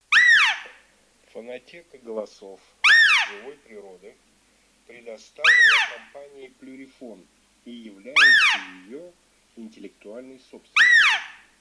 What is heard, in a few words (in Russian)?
Косуля самка Capriolo; plurifon